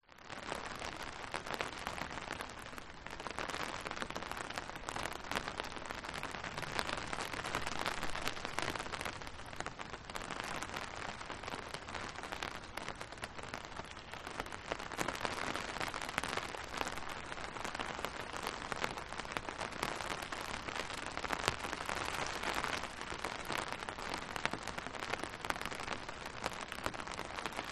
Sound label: rain light umbrella; nature